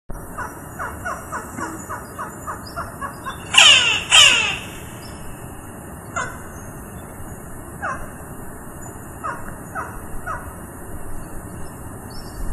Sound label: Campephilus magellanicus; PN Nahuel Huapi secc Challhuaco